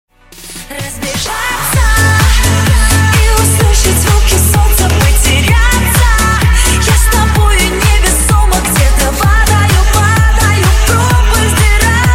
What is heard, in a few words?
sax remix ringtone